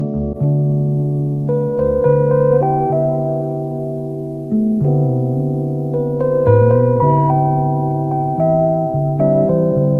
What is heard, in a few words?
relaxed scene